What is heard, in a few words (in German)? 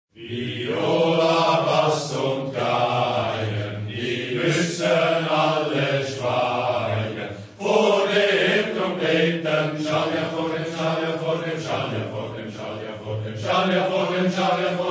Viola Bass und Geigen